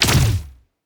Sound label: Weapon Shoot